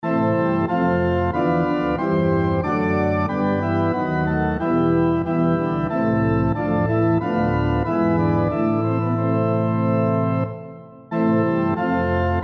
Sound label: SATB on Piano Example